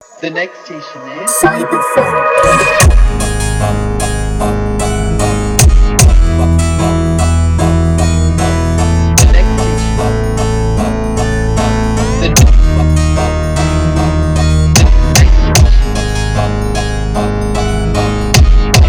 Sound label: Cyberphonk Slowed Pitched Down